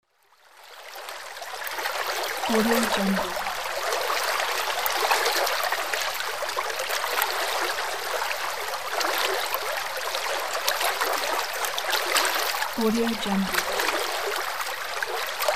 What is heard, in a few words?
flow of the small river